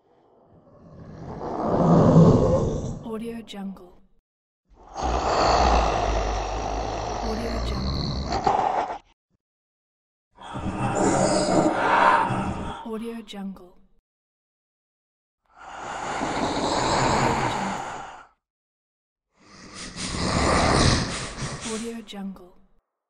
monster creature voice

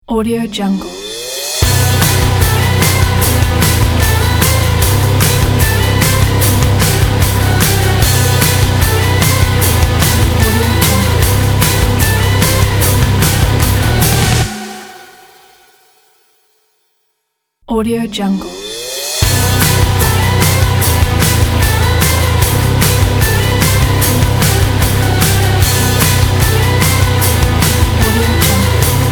melodic metal logo

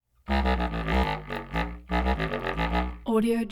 contrabass clarinet short low range phrases

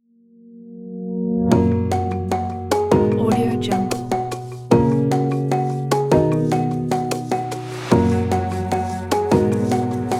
soft positive digital corporate

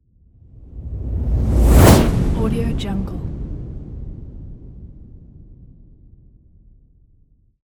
whoosh air

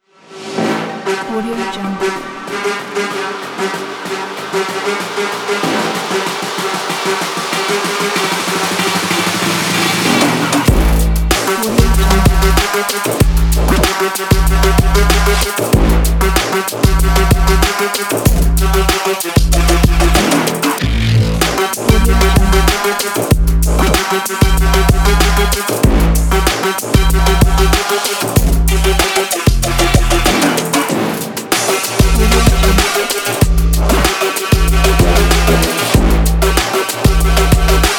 action trap

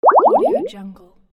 error

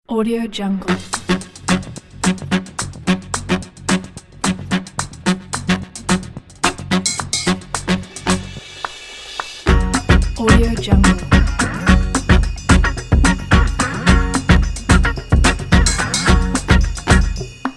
new orleans soul party

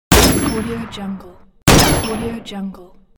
sniper rifle wa200 shot

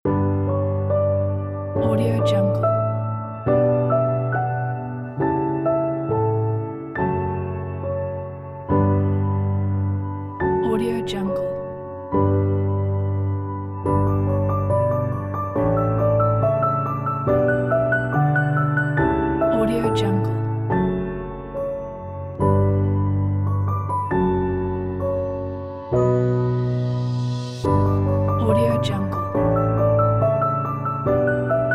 happy and romantic moments

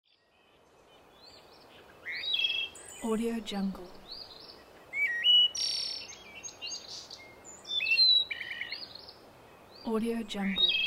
forest

